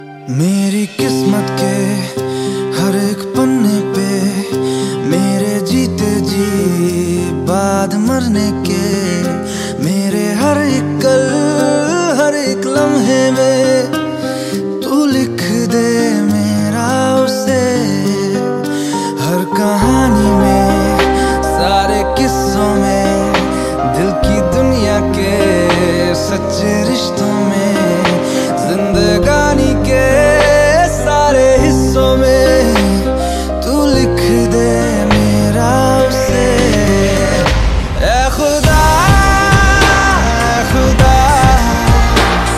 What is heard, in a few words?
Hindi Song Ringtone